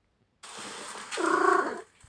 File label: cat purrr while running